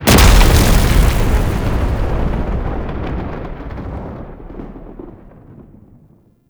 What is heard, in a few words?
Exit Door Exterior; Duviri Murmur; Oraxia